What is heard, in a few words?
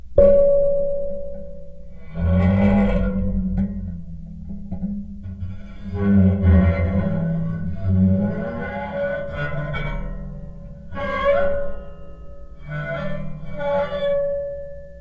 Table Guitar Dry